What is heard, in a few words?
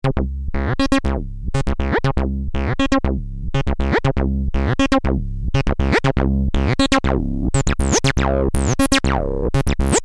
x0x